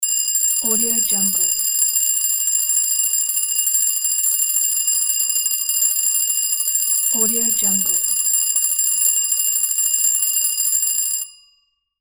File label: alarm clock ringing